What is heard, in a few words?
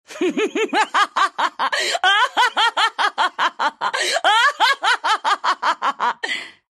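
laugh, Russian; Luna; dota2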